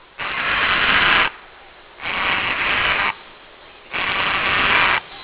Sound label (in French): heron